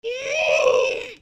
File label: scream, zombie screams